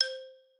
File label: xylo